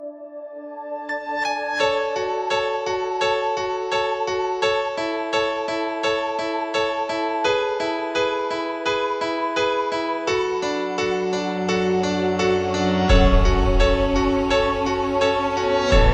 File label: instru